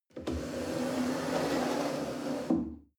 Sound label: chair wood slide on floor soft